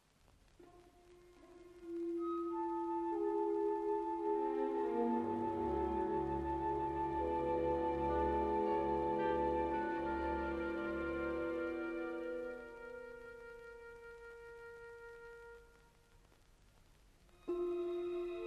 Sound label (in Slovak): Orchestralne studie